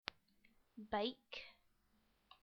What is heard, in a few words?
bike